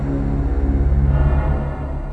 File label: BATT LOSE